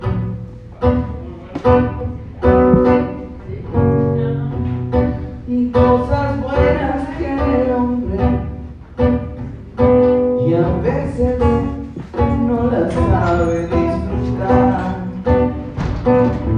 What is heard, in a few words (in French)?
Purmamarca Argentine musique